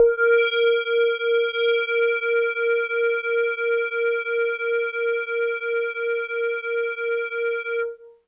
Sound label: Hologram Kawaii As3; keys